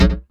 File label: Korg, FX